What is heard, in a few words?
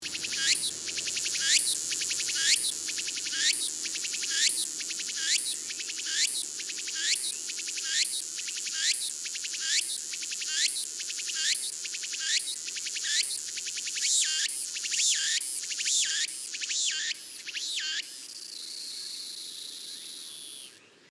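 cicada tsukutsuku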